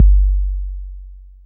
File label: Bassdrum